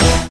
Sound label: quick Stop; twin spin